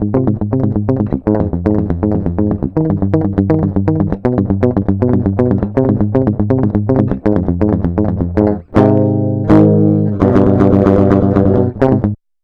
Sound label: Song